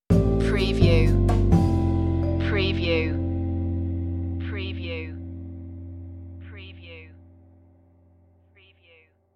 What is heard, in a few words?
jazzy idents